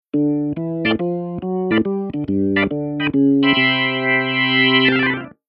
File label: Keyboard Organ Drawbar